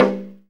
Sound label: SNR LO SOFT, VINTAGE FUNK; Zero-G; Funky Elements